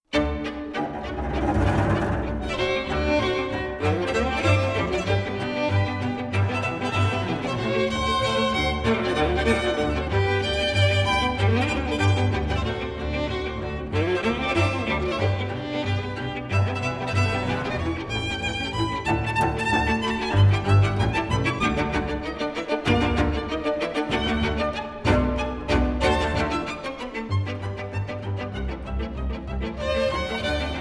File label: Thunder and Lightning Polka; Johann Strauss jr.; 原音精選 The Perfect Sound